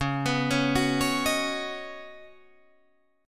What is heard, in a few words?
C sharp 7th Suspended 2nd Suspended 4th C sharp down Guitar Drop D